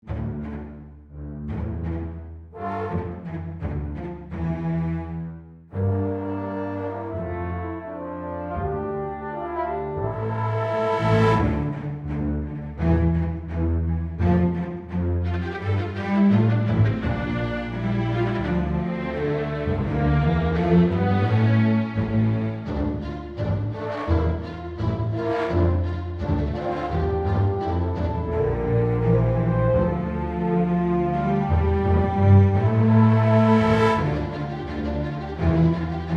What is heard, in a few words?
ORCHESTRAL